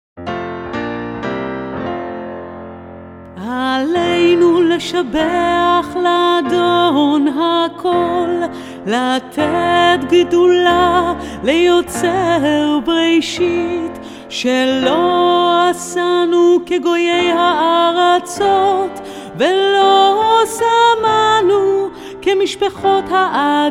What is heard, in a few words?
Aleinu - female voice